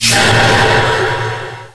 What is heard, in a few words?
disc return; ricochet